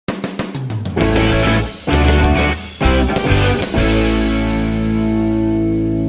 Sitcom Intro

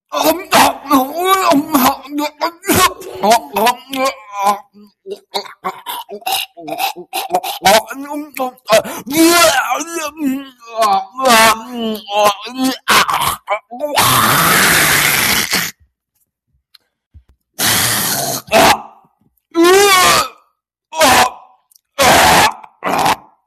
Throwing Up

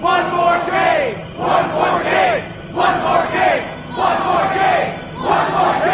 One More Game Chant

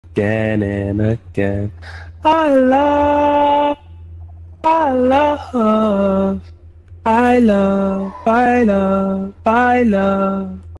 Man Singing

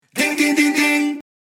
Ding Ding Ding Ding

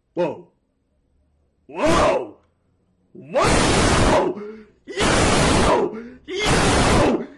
Woah Woah WOAH YOOOO

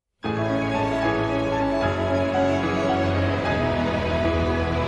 A Very Happy Song

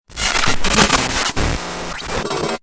Glitch

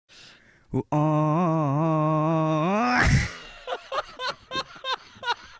Auhhhhh Laugh

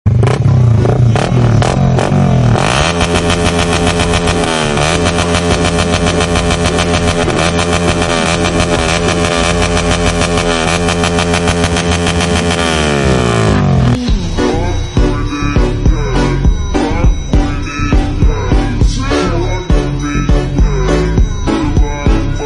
Bike Revv

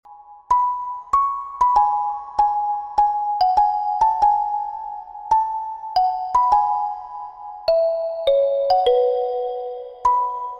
Creepy Tune; Freddy

